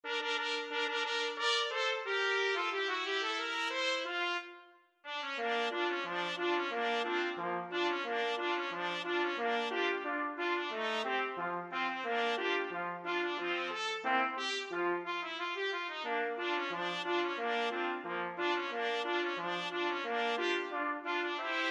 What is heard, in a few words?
radetsky march trumpet quartet